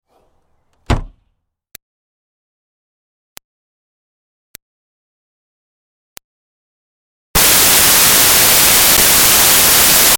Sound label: Car Smart Car Hatch Close; 2005 Smartcar Fortwo; Vehicles